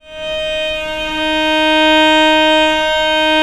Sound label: VL D#3, VIOLE, Historical Instruments; USB Soundscan vol.23